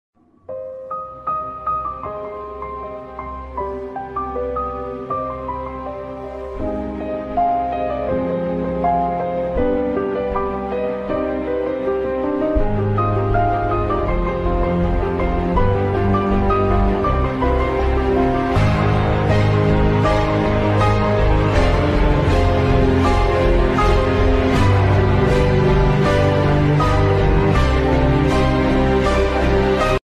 instrumental ringtone bgm

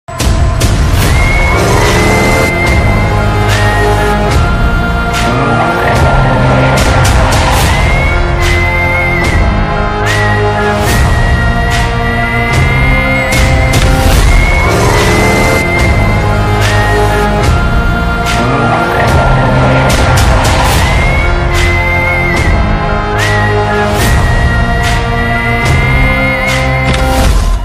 animal whistle bgm; rashmika m; bobby d; sandeep r vanga

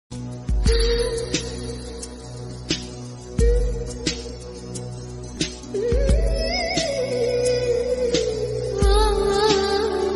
humming

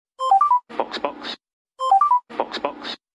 box box ringtone

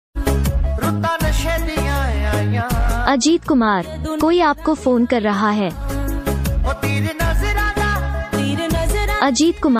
hindi romantic ringtone

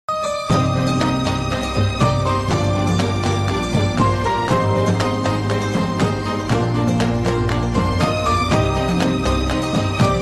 love bgm ringtone, tamil; siva manasula sakthi

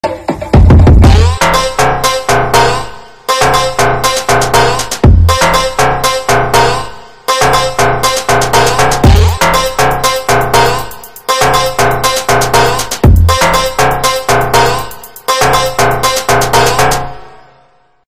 theme bgm; marco; jagadish; unni mukundan; haneef adeni

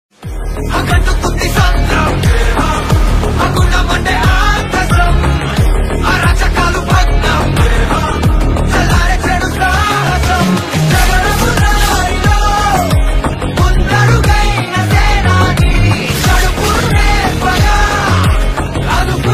Ringtone Telugu Ringtone; Devara

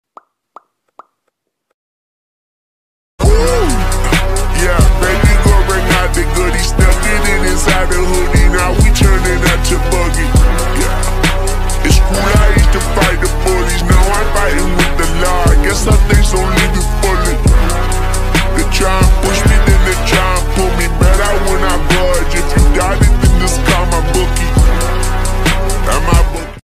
ringtone slowed trending rap song

